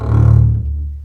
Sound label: String Collection, MARCATO